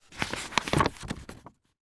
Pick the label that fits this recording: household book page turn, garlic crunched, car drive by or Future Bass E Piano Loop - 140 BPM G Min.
household book page turn